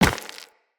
Run Rock, Footsteps; Operator

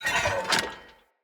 Light Mirror Move C; Puzzles

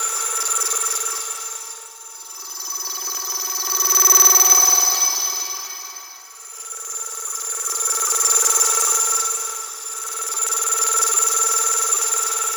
Sentient Cave Column Loop; Warframe; Tau; Ambience

